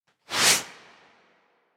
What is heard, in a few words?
Whoosh Stereo Light Transition